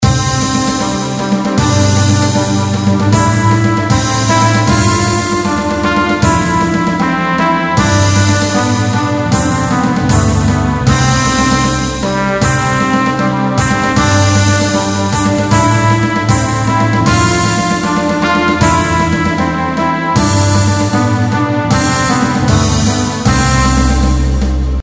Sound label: victory; BGM; ショート